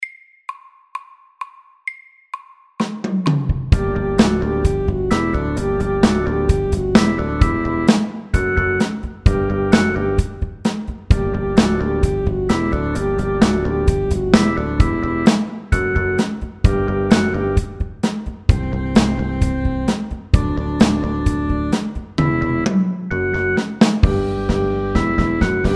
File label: Rocky poppy sax FORT